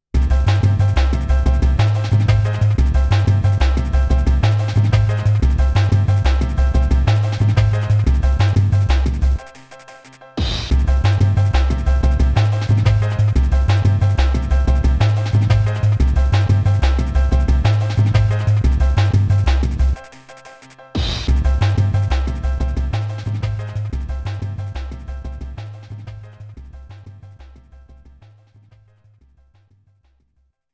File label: Player Piano; Aero Fighters 3; Sonic Wings 3